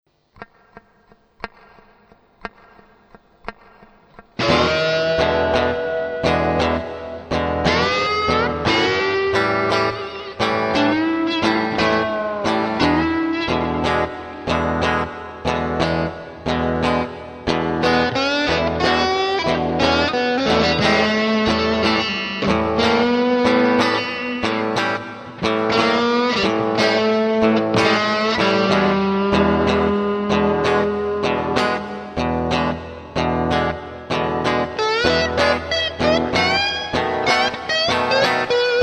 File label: Blues; PremiersAccords